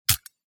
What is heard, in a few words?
Padlock key pull